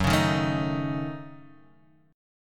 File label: F sharp Minor 6th F sharp Guitar Half Step Down